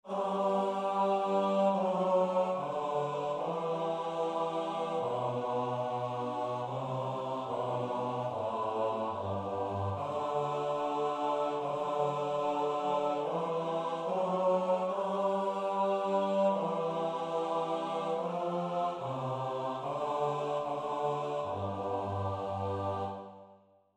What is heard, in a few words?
Psalm bass